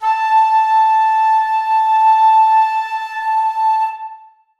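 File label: flutes sustain a3; Sonatina Symphonic Orchestra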